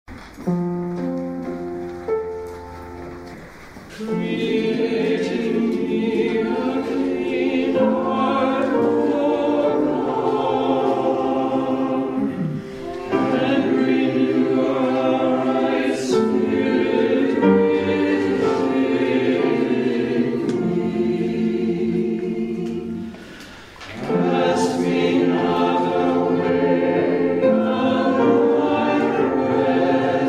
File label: Hymn; Create in me a clean heart